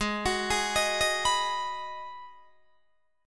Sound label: G sharp Minor 7th G sharp, Guitar Open D